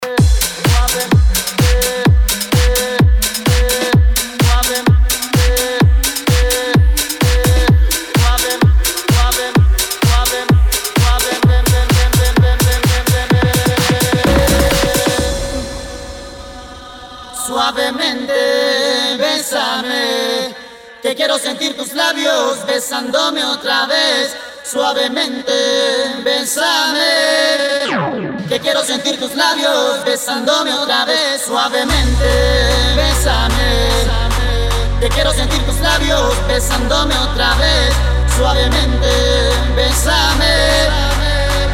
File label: Transition